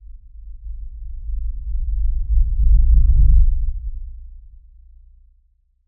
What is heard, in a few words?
Woosh low long